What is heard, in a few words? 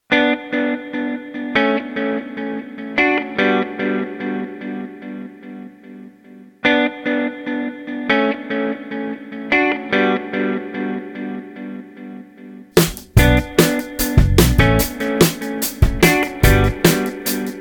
INTRO music; Psychic Talk